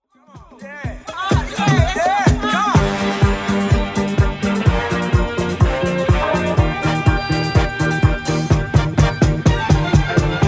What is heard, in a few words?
70s 125bpm; remixplanet